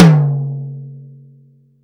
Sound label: TOM, Drum Dry; East Collexion; Drum 1